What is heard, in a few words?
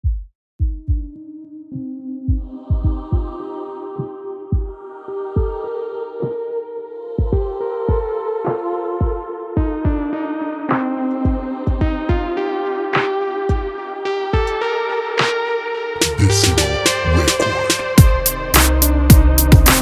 beat